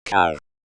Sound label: car